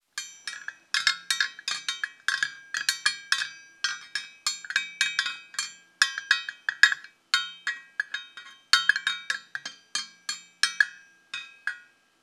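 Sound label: metal rattle ring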